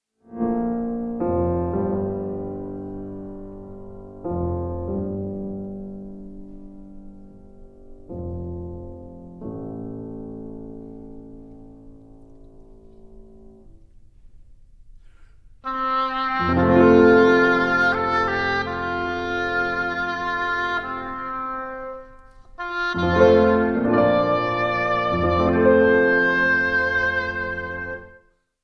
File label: Caprice on Danish and Russian folk songs for flute oboe; clarinet